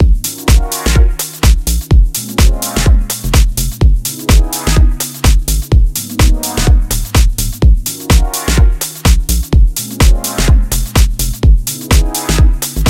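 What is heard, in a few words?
instrumental mix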